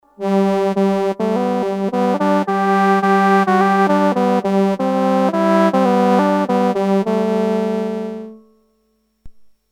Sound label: ewi, freqbox moogerfooger; Sax Akai Ewi 4000s – suono sax; VCO: 0 SYNC: NO WAVE:tri ENV AMT: 0 FM AMT: 0 MIX 4; MF-107